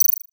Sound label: micro high tech, high tech interface sounds; Lesser Vibes